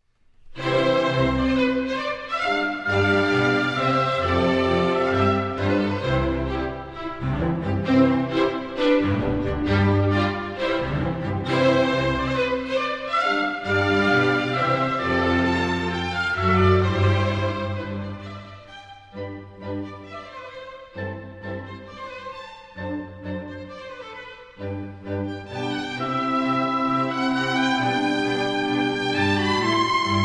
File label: Cello Concerto No. 1 in C Major I. Moderato